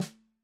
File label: snare; soundscrate